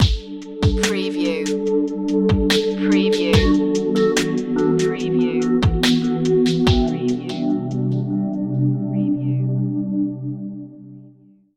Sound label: square drops stinger